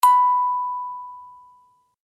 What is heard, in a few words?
Ding Notification